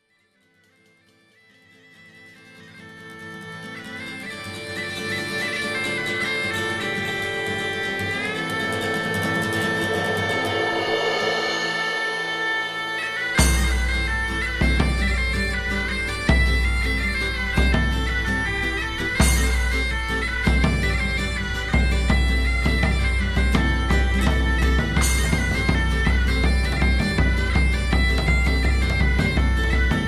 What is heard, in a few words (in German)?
sackpfeifenschlag